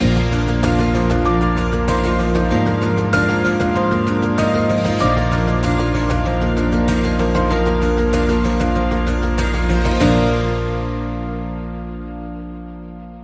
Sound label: Bumper